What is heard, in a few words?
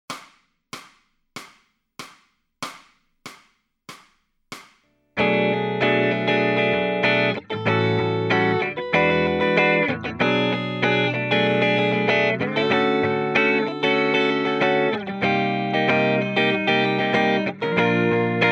Lead Backing Track, electric guitar